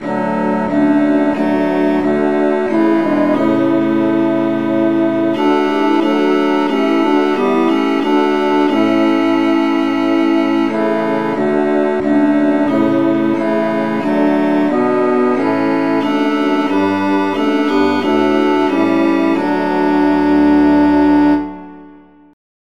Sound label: traditional, key D; SAINTTHOMAS